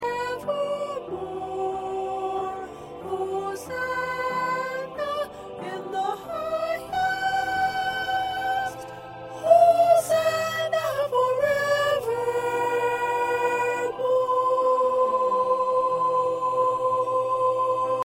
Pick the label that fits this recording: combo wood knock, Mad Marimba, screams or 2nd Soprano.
2nd Soprano